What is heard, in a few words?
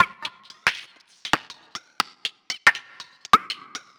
Rhythmic Voice Effects, Vocal Percussion; Instruments; Ableton; Factory Packs; Voice Box